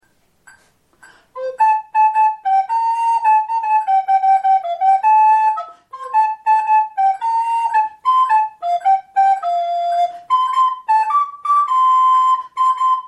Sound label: malbrough, FLUTE